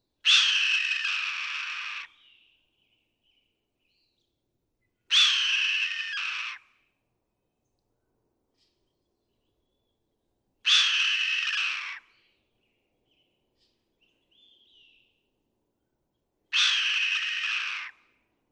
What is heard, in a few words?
Red-tailed Hawk